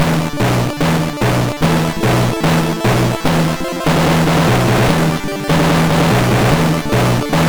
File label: MOS6581R2, sid